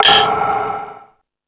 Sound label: Hip Hop, Drum Machines E-MU Pro-Cussion